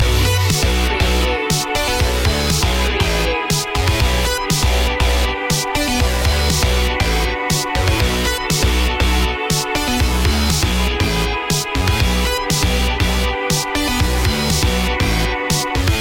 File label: super chip world loop